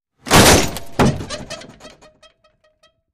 Impact Big Metal Car, car crashes

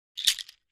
Shaker Bracelet Natu, percussion shaker

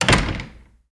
Air Door Closes

